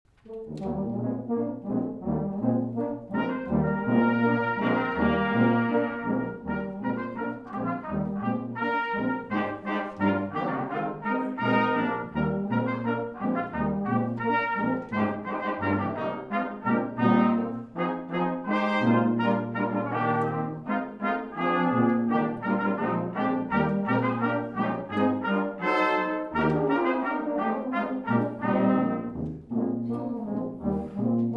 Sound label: Brass Ensemble